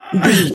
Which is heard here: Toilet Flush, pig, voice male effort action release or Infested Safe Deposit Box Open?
voice male effort action release